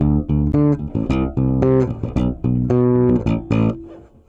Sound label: Ploing, Bass Drum - Kicks